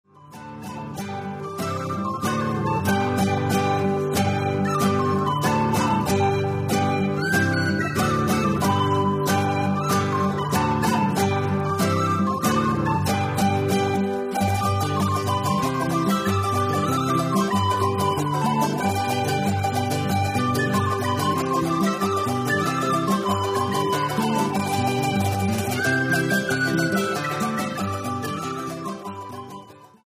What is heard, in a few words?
Peso Whistle